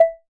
Electronic, notifications; Oppo Find X5

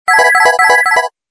Serene tone; Samsung; SGH-G800

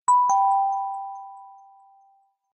Bell Ring; Vodafone Smart-Mini

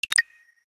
Lock, phonetones, LG; LG Wine Smart F480K

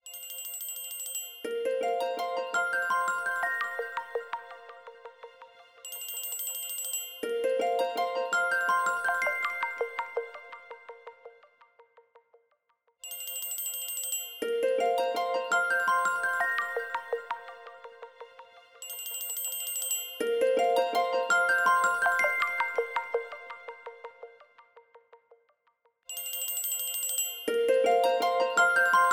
Alarm Glassy Lustre; Vivo; X3S W; alarms

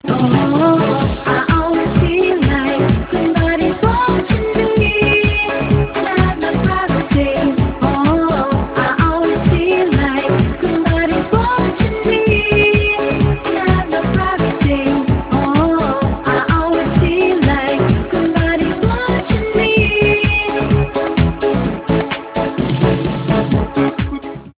soundalike, chorus part; Arphiola ringtones; Soundalikes and covers

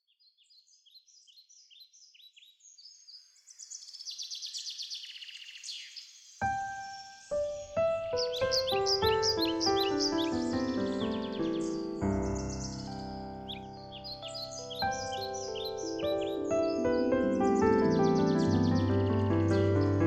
Singing Birds, ringtones; LG K92 5G

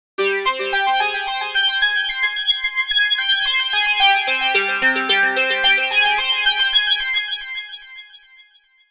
Edgy, phonetones; Sony Ericsson; PlusFourSix; Thomas Tibert

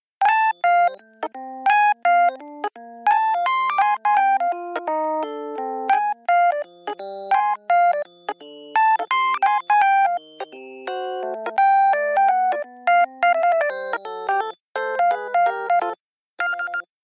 Jazz Tone; LG AN430 Wine II

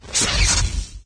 sfx player die; LG GW820 eXpo

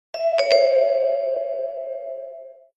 Space bell, ringtones

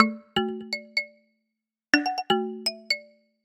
boot sound; HMD; Pulse

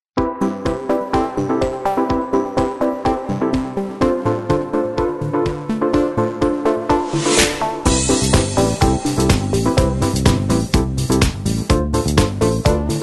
Floating, Alcatel phone tones; OT-660

